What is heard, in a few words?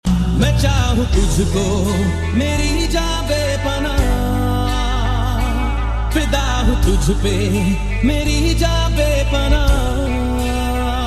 Power Ballad